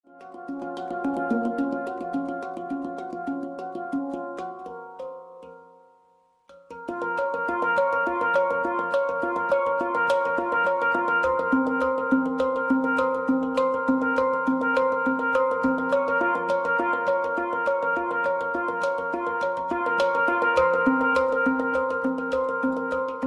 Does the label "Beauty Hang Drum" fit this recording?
Yes